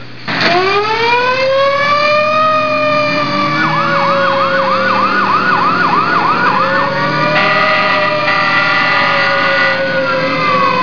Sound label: fire engine